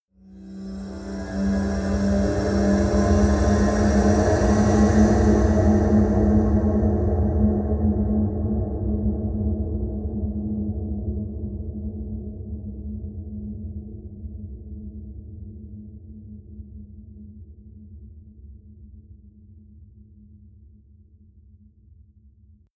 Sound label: rising ufo, risers